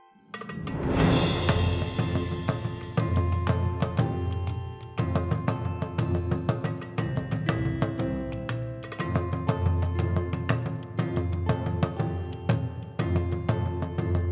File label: Music Roguelike Menu Loop; Cornerstone The Song of Tyrim